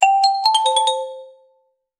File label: notification for messagin; alerts